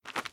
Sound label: Cave Crouch; The Inn